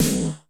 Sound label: 3D S909, 3 D AMBIENCE